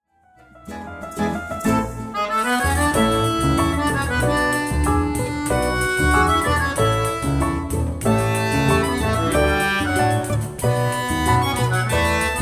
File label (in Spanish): tango